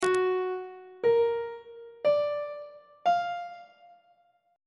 d sharp harmonic minor 7th chord 3 is f sharp augmented major 7th on treble clef